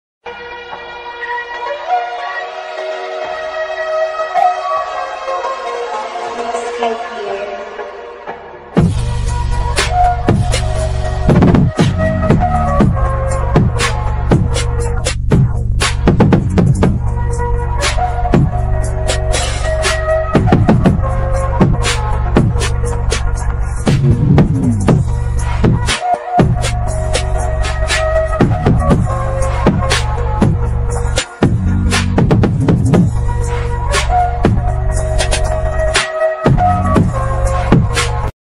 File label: Attitude bgm ringtone